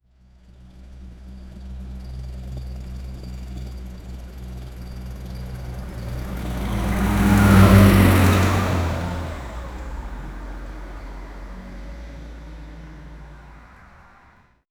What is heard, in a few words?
auto rickshaw passing by